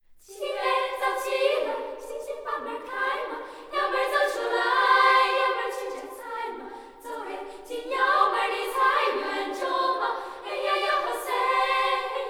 Children's Choir

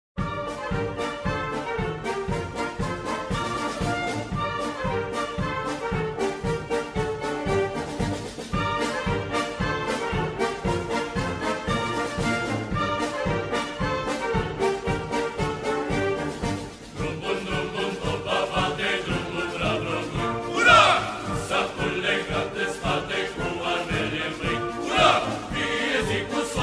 March; Royal Romanian

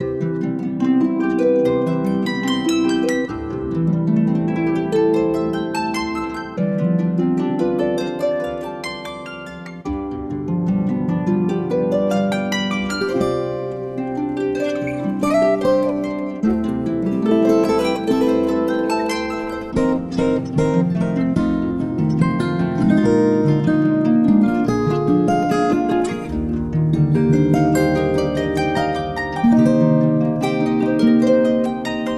Relaxing Harp Music

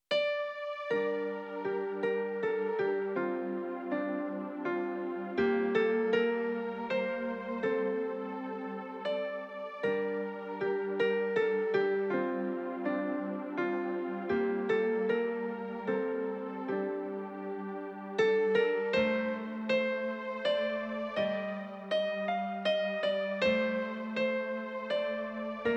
Folk music Folketone

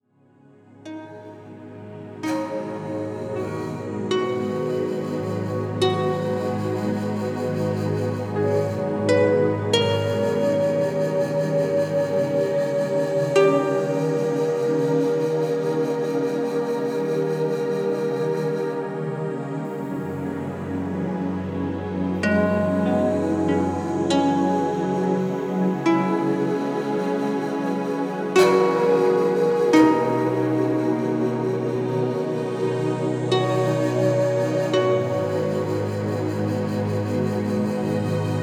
Harp Music

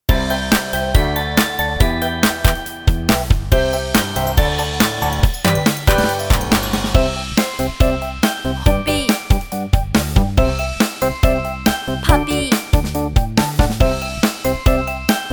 Song Vietnamese Version

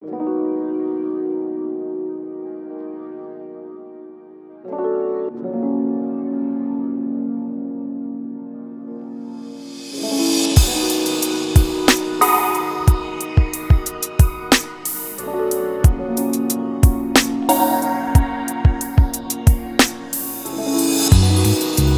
Jazzy Tune

